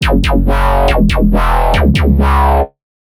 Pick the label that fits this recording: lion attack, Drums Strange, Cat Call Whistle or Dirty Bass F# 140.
Dirty Bass F# 140